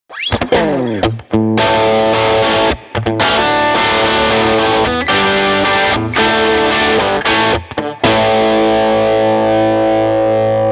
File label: Brit Rock